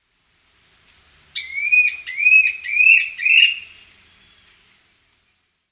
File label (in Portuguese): arapacu de cerrado